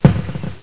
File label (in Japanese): HANABI